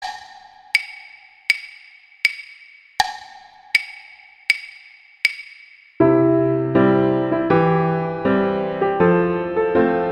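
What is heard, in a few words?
accompaniment; Christmas; O Holy Night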